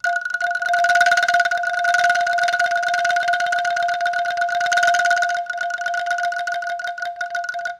anklung anak

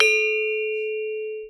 gong D7, keromong

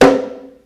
gendang ibu

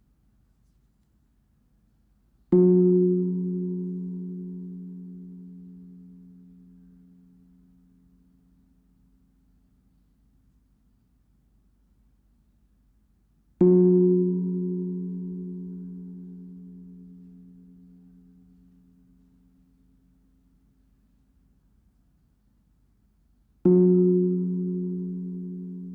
gongs

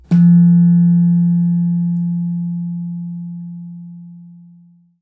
slanthem; Saron

